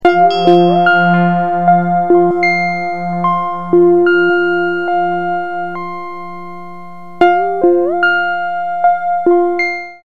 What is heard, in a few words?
GONGS